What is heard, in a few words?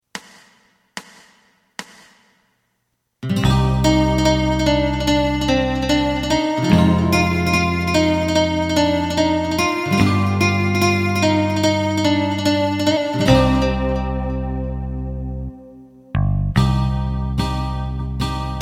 drum